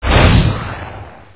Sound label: fireball flyin